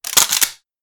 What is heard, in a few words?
Shotgun Rack